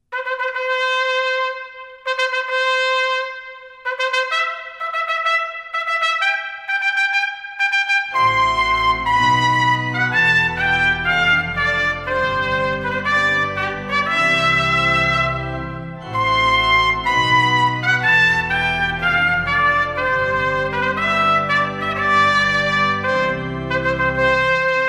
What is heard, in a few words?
Wedding March